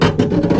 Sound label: Structure Crunch; The Avali's Audio Overhaul